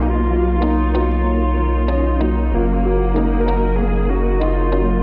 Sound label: keys